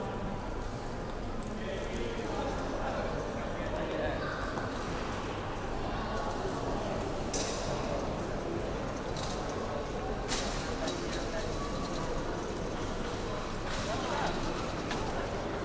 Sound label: newcastle airport arrivals